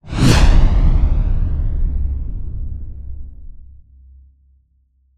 Swell To Impact Flanged; bottlerocket

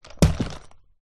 foley luggage case small childs drop on carpet

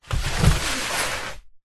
foley cardboard box large push slide floor

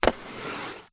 office chalk draw line med on blackboard

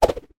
weapon shuriken flying star throw; kung fu

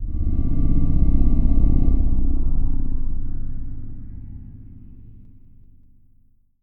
Transition Whoosh Flutter; bottlerocket

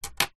office pen plastic biro down on table

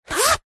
foley zipper clothing or bag single fast movement; zapsplat

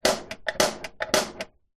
household bbq ingnition press x3; zapsplat

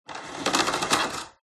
rope vacuum cleaner; fox audio

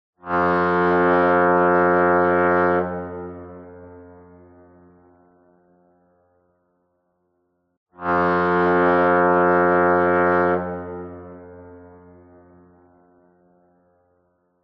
industrial fog horn

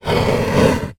rock slide on wood; foley; zapsplat

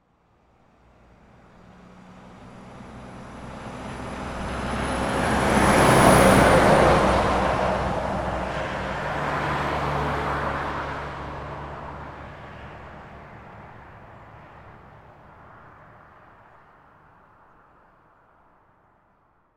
transport truck pass followed by car

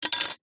household bbq tongs set down on concrete; zapsplat

